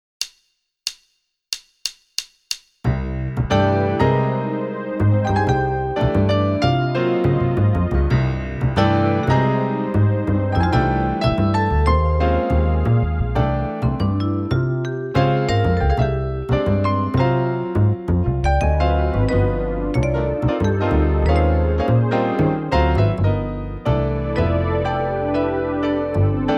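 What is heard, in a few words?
Backing Contemporary Jazz; V-Drums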